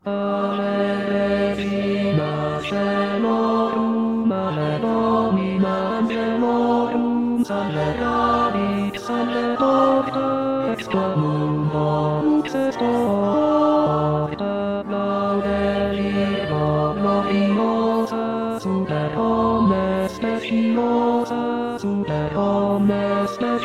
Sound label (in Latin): Ave regina coelorum IV (basse)